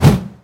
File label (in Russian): rezkij chetkij zamah katanoj